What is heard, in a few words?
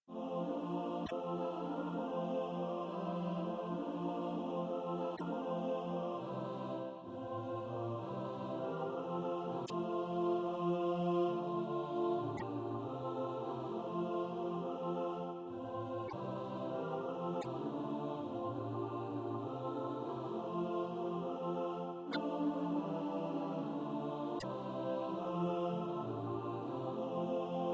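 alto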